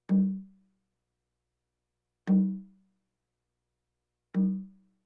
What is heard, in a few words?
Percussion Bongos Tympani rolls Gongs Drums